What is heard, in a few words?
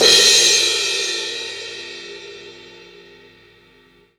CYMBAL, CRASH; Sonic Foundry (Sony Creative Software); Drum Tools; Laidback